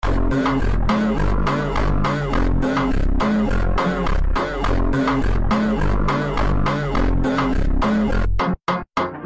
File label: phonk